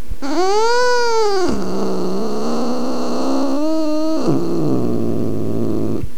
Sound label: growl